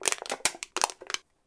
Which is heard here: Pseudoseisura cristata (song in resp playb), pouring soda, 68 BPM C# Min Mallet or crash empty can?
crash empty can